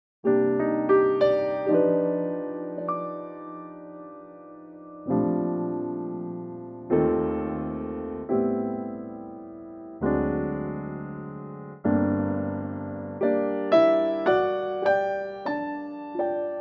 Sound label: Bb Piano